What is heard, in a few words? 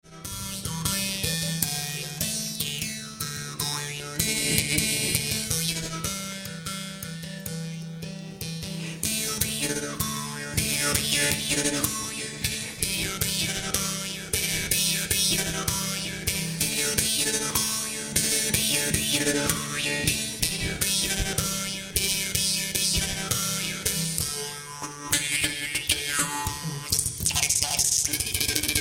Kou Xiang Solo; Pagendarm Club; Innovative Jews Harp